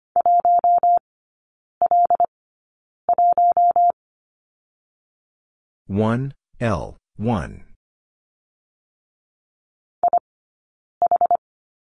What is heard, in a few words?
Number 5 Three Characters 25wpm, morsecode